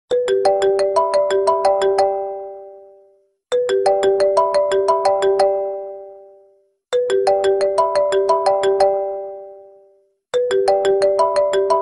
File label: ring; Iphone